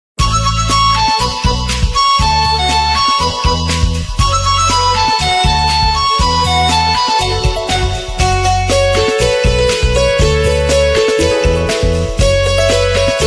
ringtone

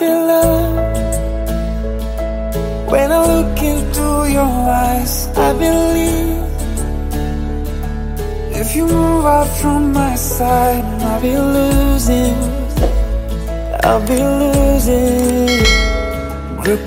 english love ringtone; english ringtones

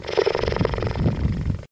cheetah purring ringtone

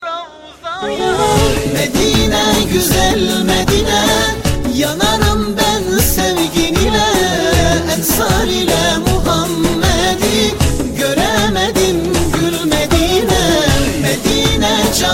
islamic ringtone

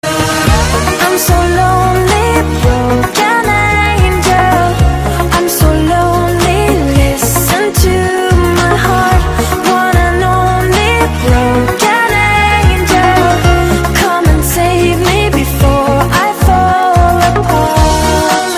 hollywood ringtone

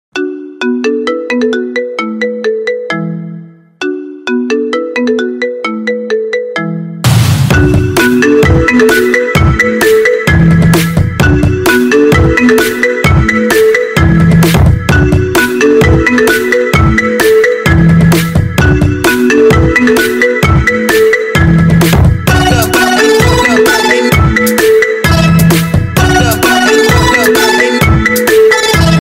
iphone 9 ringtone

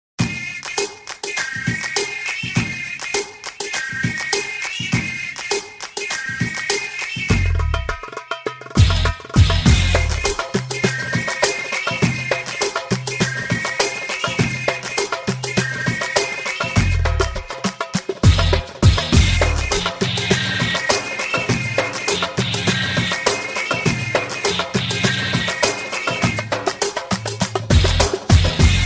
good arabic music ringtone

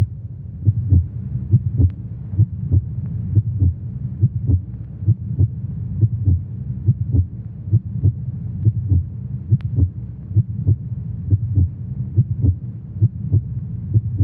heartbeat ringtone

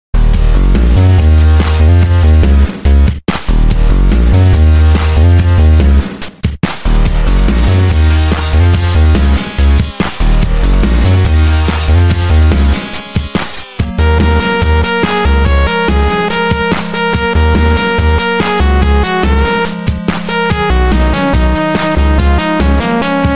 bass boost ringtone

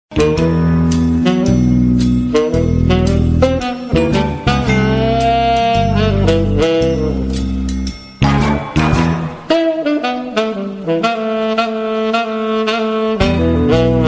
funny cartoon ringtone

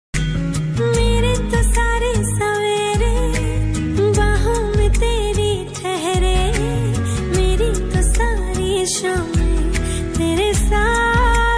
hindi ringtone bollywood

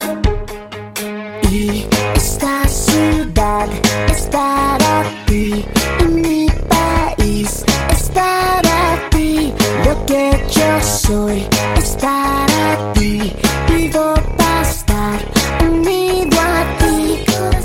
spanish ringtone

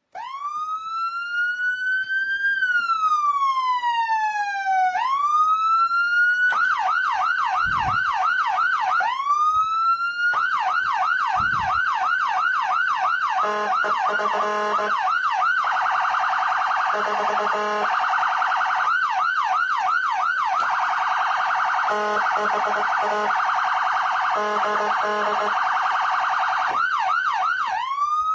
whelen siren ringtone

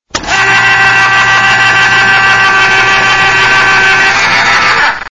can opener

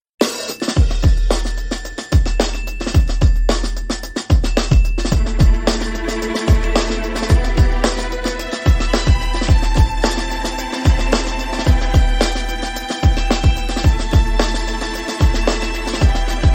music ringtone, english

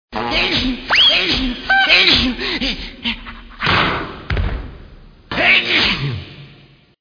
cant stop sneezing ringtone

